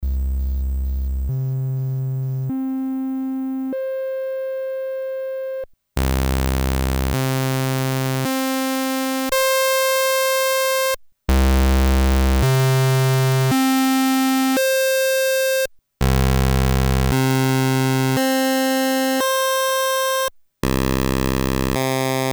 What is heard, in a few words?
waveforms, all VCO shapes; TEST: all VCO shapes; Polivoks formanta